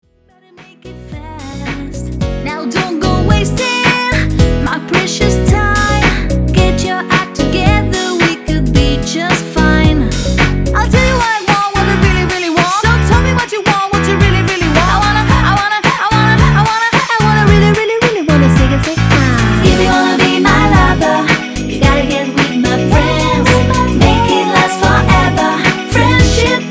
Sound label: m Piano m Backings m Lead